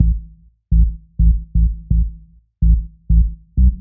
126 A BASS, Funky Underground bass loops; Loopmasters; Funky Underground Vol 2

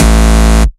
Bass Shot A

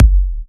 Bassdrum

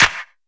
Claps & Snares

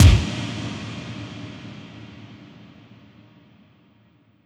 FX Impact

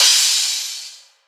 Crash, Cymbals

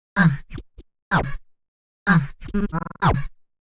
126 E VOCAL LOOP, Funky Underground; Loopmasters; Funky Underground Vol 2

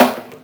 Percussive

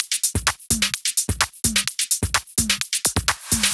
Loop, 128 BPM

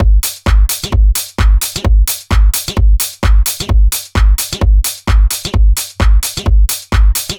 130 BPM, Digital Breaks; loops; Loopmasters; Jon Carter & Alex Blanco; Block Party Essentials

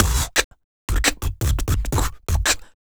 Human Beat Box, Drum & Bass; Loopmasters

